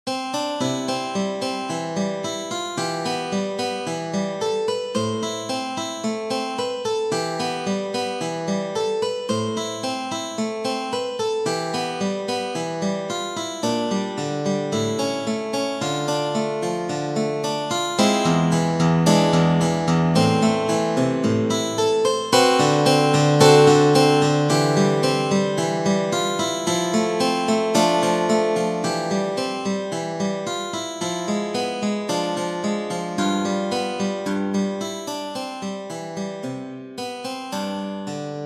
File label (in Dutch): melodie